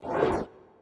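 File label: space power obelisk piston; smite; Anhur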